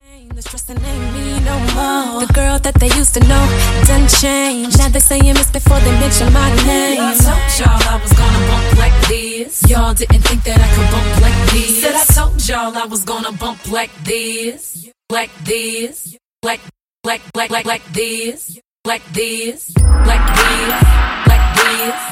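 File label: Acapella Out Surprise Break Wordplay 98 100 BPM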